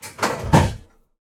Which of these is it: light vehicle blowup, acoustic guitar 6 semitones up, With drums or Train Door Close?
Train Door Close